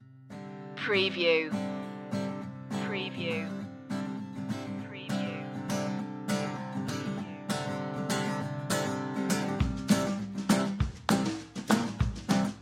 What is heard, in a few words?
stomp underscore